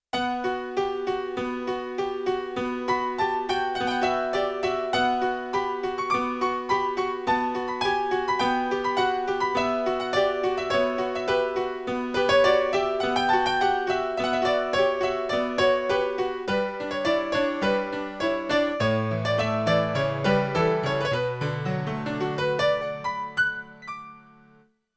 Surrounding Piano; Yamaha TX16W; Jazz Performance; Jazz Performance 2

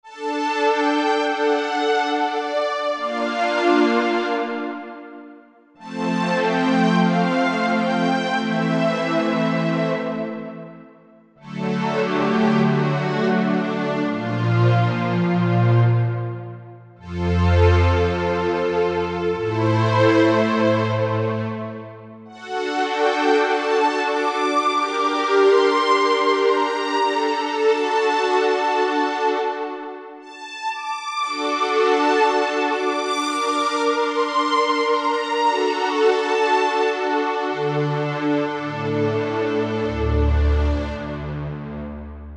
2 voices; Korg MSC-12S Synth 3

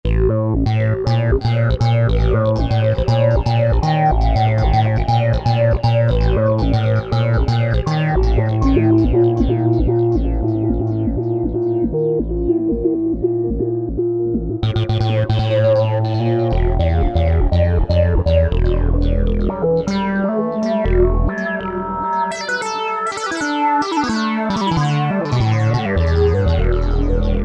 Flanged DDL Bass, Korg TR-Rack

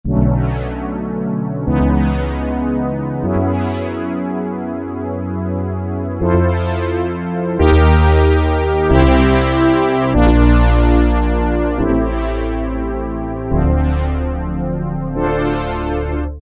Slow Brass, Roland MKS-70

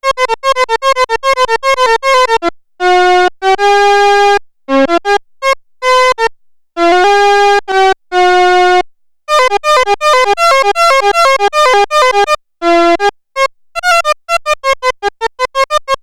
ACCORDION, Roland SH-101; Factory Patches

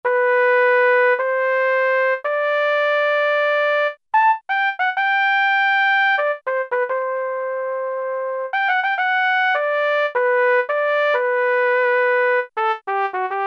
Brass Flugelhorn 1; Brass And Woodwinds; Roland L-CDX-03

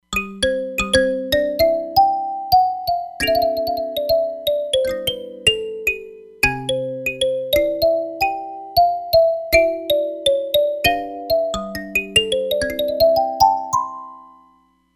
Kalimba, Roland Fantom XR; Nice Kalimba

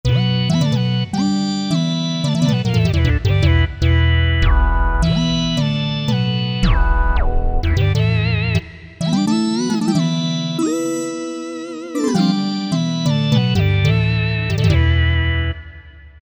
Generalmusic Equinox

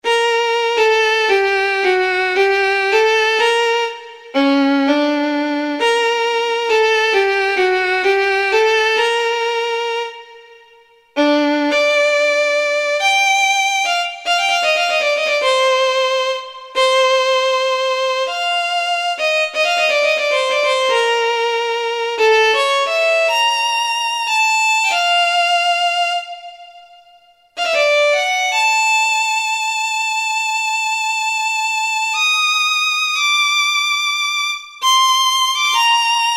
Solo Violin; Kurzweil K2000 Orchestral ROM

